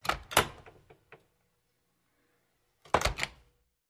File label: Emergency Exit Door, push bar